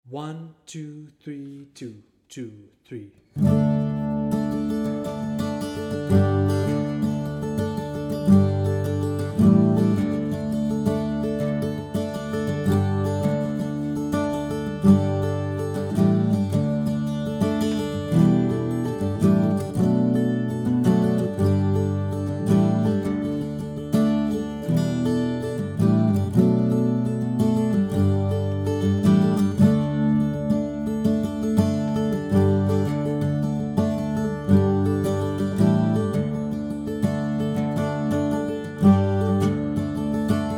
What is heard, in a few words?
Guitar Full Speed; The Whinny Hills Of Leitrim